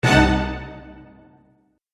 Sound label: Scream Orchestral Movie Scare; Musical